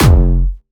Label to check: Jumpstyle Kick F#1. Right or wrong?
Right